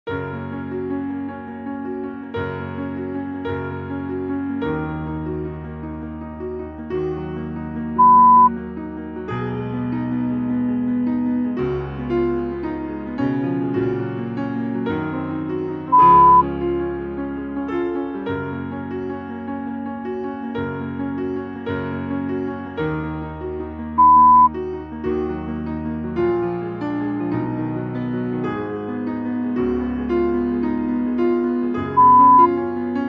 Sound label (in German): Klassik Klavier